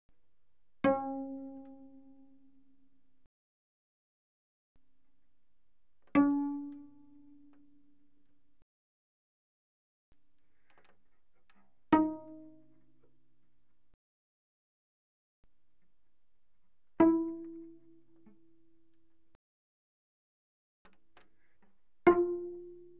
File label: Cello pizz mf sul D C4 B4; Strings